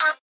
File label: vox